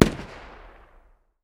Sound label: ak 762 medium distance; tacz-tweak-pack; taczmechanics